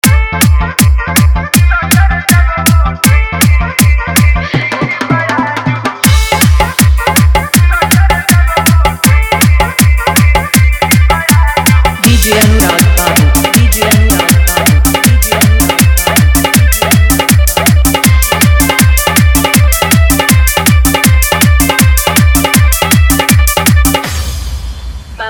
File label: Full Dhollki Bass Dance Mix